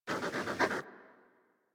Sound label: sleep level up pant fast, Kubrow; Enemies; Warframe; Tame